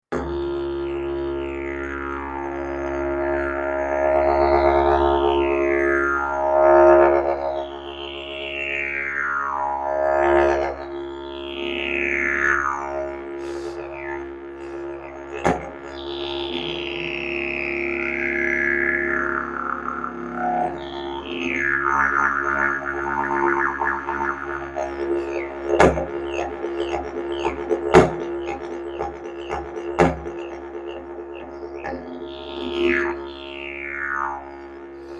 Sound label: didge